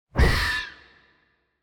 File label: Unstable Bat Rock Item Throw; TANK; 3Souls